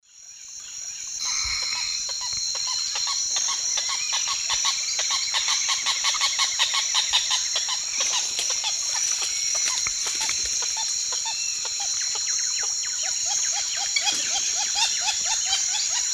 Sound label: Jacana jacana, 2 call types; S. America; Eastern Lowlands